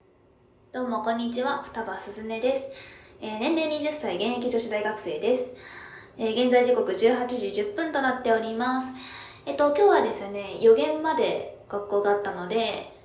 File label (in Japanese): プラコップにおしっこ